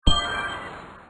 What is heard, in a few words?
break; Jewels Match